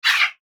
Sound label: Whoosh High Tech; Multimedia; Blastwave